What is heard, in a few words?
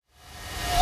Reverse Impact; Cymatics